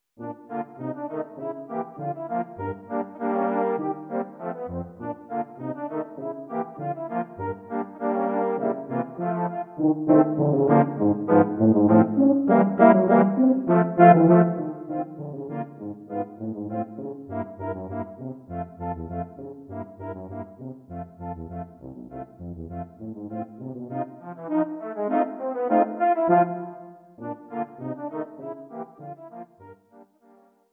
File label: Polka